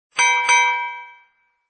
lottery start

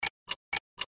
audio reminded; twoniuniu

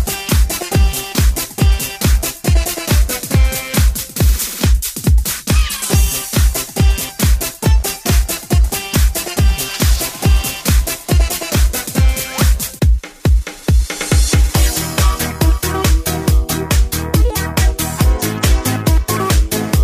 bet Time music; luxurycar

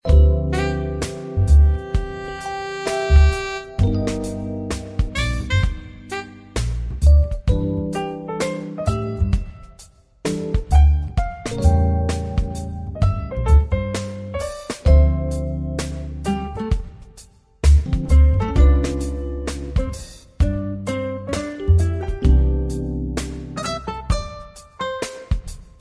bgm bonus

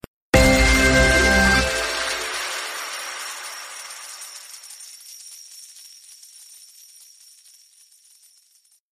end of big win

